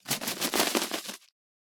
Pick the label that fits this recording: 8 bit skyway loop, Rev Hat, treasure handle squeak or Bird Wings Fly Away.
Bird Wings Fly Away